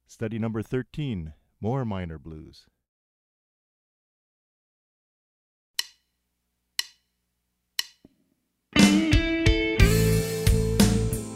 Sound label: Minor Blues